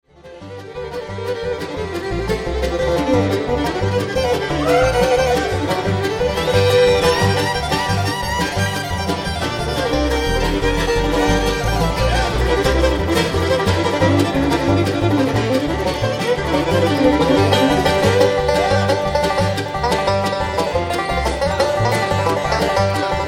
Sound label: bluegrass breakdown